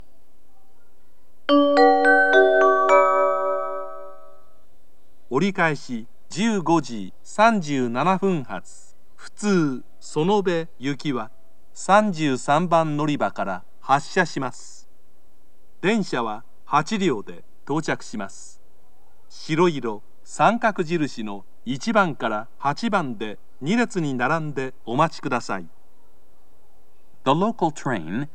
turn local, kyoto, station; sonobe

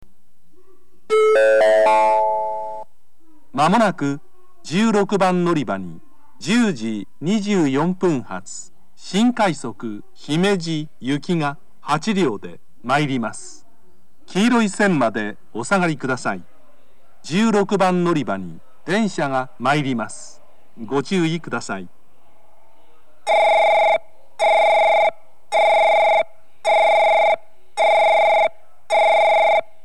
shin-osaka new rapid; himeji

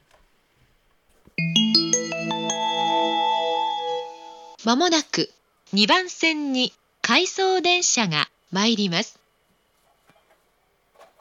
chigasaki out-of-service; station; jr-east

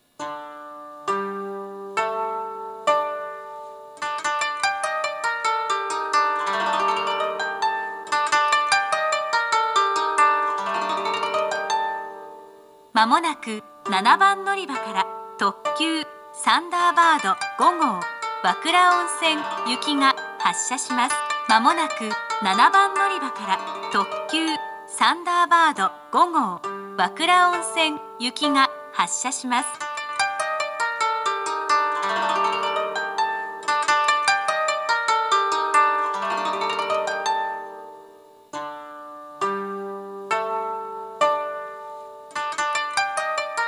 kanazawa limited express; thunderbird5; wakura-onsen; jr-west

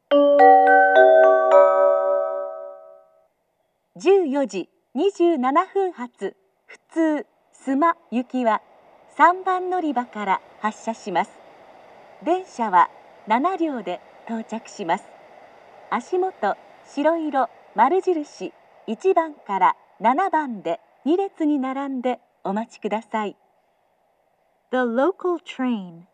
nada local suma; station

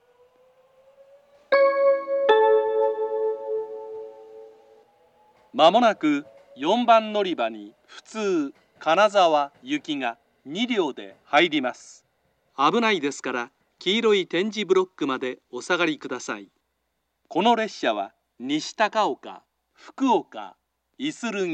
takaoka local kanazawa, station; 普通; 金沢; 普通 金沢行 2両編成です。

